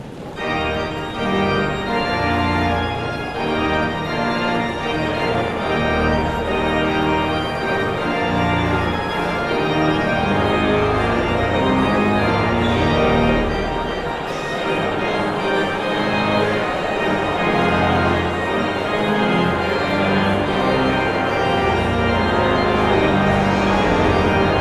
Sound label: Postlude